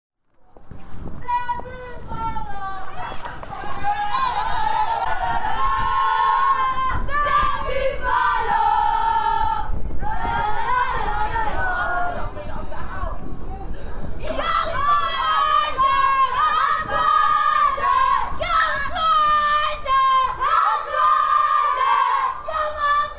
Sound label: chants